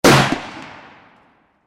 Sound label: warfare gunshot rifle exterior; Rifles; Rifle Gunshot (Exterior) 4; McKinney Sound